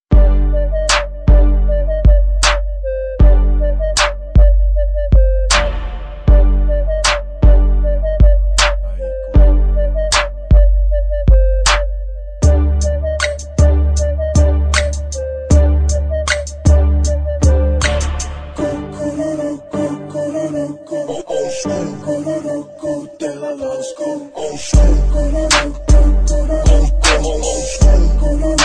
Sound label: Mega Bass